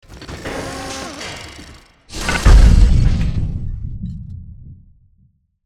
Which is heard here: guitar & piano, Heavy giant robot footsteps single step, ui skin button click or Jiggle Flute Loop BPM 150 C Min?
Heavy giant robot footsteps single step